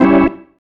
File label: Stab, Organ, One Shots